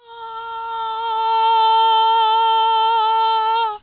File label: singer A4